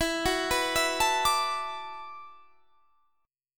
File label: E 7th Suspended 2nd Suspended 4th, down; E7sus2sus4; arpeggiated